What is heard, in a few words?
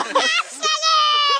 scream